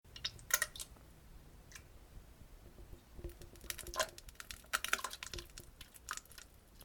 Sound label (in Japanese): なんべん ぴちゅ むちゃちゃ音